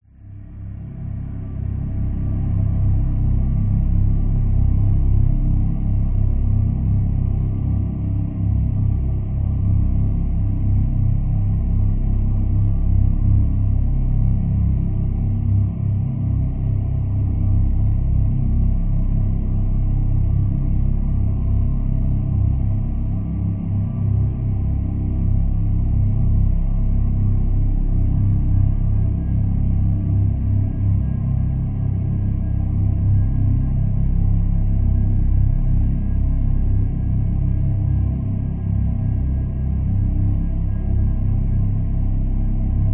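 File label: science fiction atmosphere environment dark eerie droning roomtone interior; zapsplat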